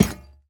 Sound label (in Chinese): 宝库放置声